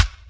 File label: bounce; blackshades